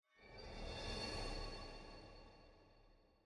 ambience room witch lodge magic

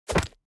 object grab stone

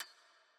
ambience room metal base

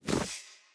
witch projectile; Shelter

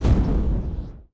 spell fire take; player

